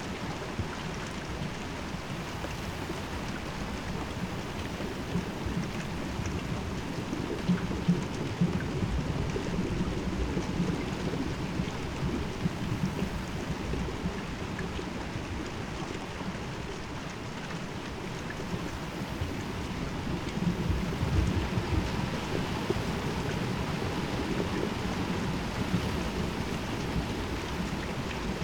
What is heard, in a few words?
outdoor town heavyrain flood